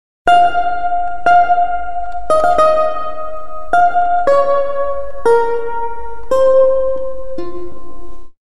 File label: balalayka